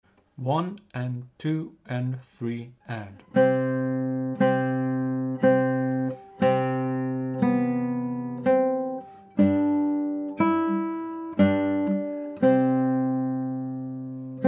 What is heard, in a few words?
60bpm, guitar